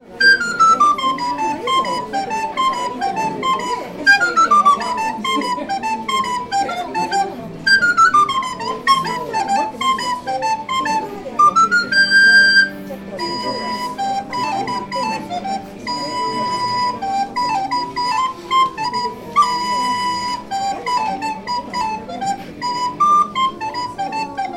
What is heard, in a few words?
recorder